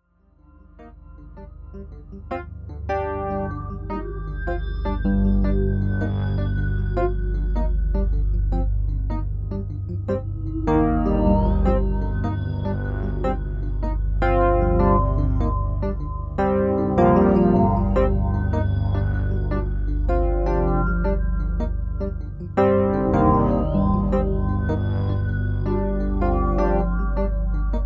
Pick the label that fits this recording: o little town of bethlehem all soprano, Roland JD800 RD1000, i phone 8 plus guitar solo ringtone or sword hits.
Roland JD800 RD1000